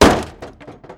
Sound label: Impact